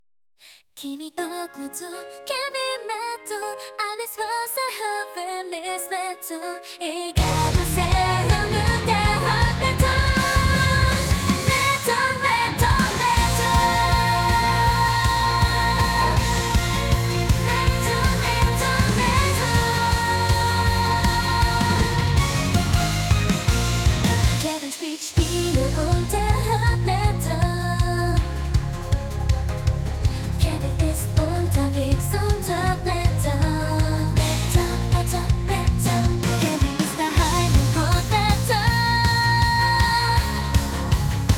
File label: Metal